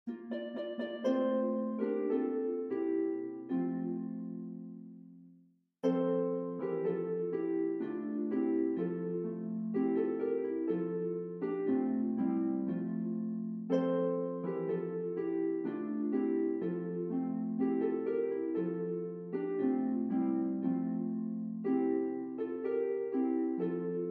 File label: Deck The Halls Harp Version